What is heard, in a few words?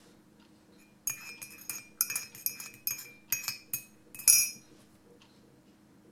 Stirring In A Cup Of Tea